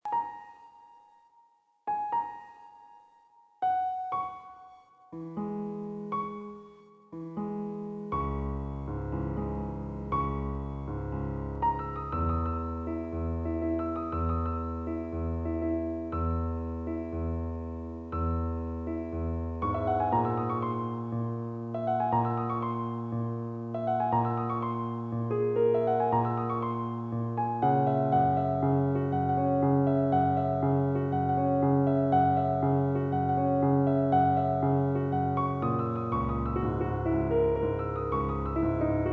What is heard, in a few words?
Piano